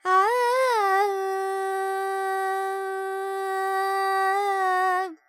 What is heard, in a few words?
Panthuvarali, Carnatic Female, alaps, vocals, 100 G, dry; Sample Banks